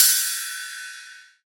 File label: Trap Ride One Shot F Key, ride cymbal audio clip tuned to the F note